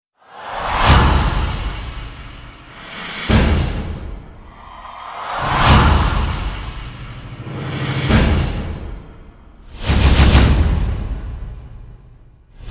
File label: Drums Only Version